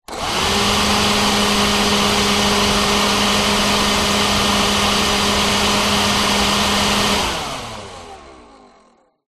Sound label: industrial leaf blower electric on run off close up short burst